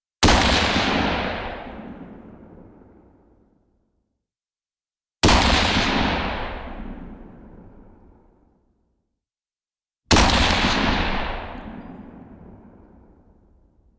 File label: M4 Shots